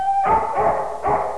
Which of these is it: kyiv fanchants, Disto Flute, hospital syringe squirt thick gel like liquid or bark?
bark